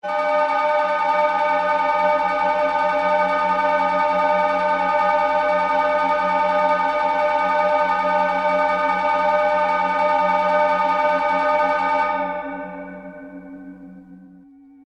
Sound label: shimmer